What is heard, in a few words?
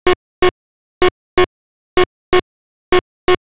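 Percussion, 126bpm, Nu Dance Electro; Zero-G; Soundclash; Combi Loops; Dubnotronic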